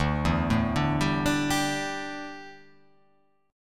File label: D Suspended 2nd Suspended 4th D down, chord; arpeggiated